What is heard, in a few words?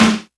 Snare Vinyl, drums one shots